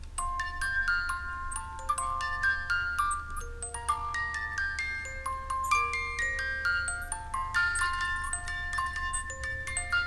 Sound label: Jingle Bells, orchestrion